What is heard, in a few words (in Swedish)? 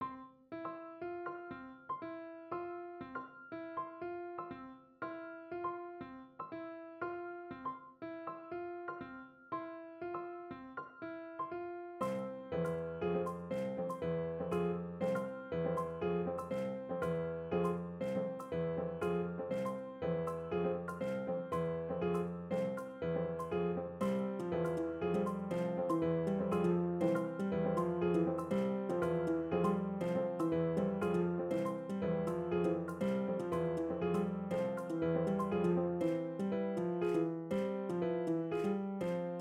tretakt treklang